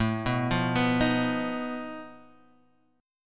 F 7th Flat 5th A

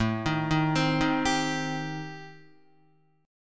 A-Minor 7th Flat 5th, Guitar, chord

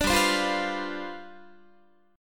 G 11th, Guitar; strummed

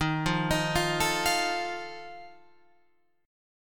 D sharp Suspended 2nd Flat 5th, Guitar, Open F; arpeggiated; JGuitar

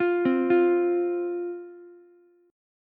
F 5th, Ukulele; arpeggiated; chord; 10,12,13,x; JGuitar

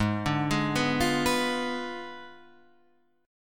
G sharp Diminished 7th G sharp; G#dim7 Chord {4 5 6 4 6 7} arpeggiated

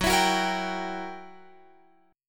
F sharp 7th Flat 9th Guitar Open F, chord; strummed; F#7b9; x,13,16,13,13,14

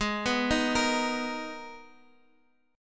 G sharp Minor Add 9th G sharp; madd9:1,b3,(5),9

